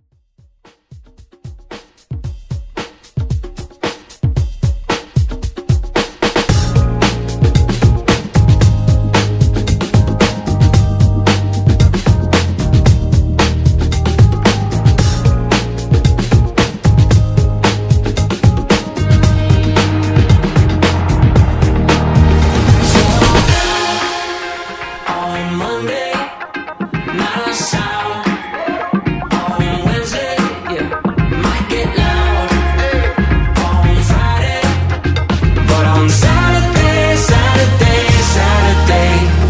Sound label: Pop; Redrum